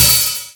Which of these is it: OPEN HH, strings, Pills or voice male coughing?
OPEN HH